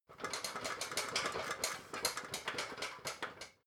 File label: ladder metal rattling long